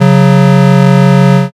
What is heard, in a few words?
buzzer end